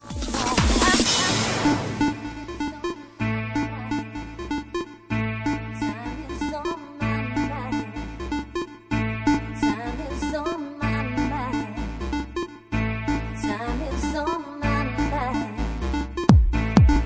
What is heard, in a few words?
Electro Remix